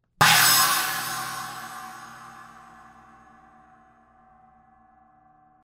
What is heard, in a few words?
ZILDJIAN 20 PROJECT 391 CHINA